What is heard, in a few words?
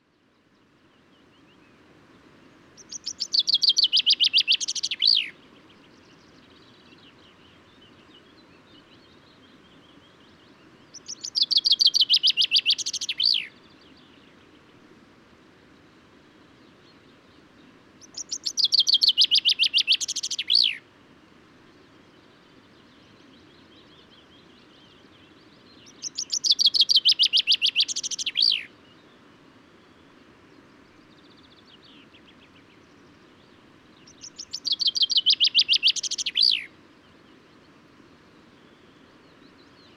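chaffinch coelebs; dul bileho labe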